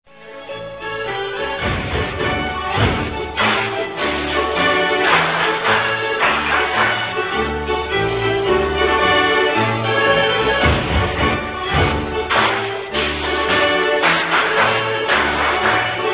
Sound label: Polka; Ennstaler; Volkstaenze